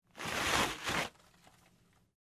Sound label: Dragging Aircraft Cargo